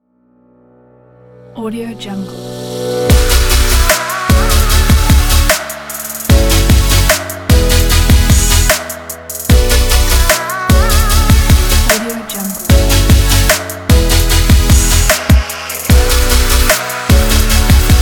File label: future bass emotion